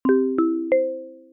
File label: shop purchase; micromayhem